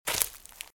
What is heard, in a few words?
Footsteps Leaves Walk Left; RadwinIsland